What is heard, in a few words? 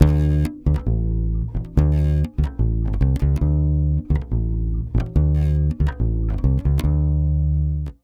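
140 bass Dm11, brazil bossa; USB Soundscan; AKAI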